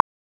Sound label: Signal to Noise Ratio; Rohde & Schwarz; UPA; Rohde & Schwarz UPA-CD